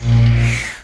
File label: BURF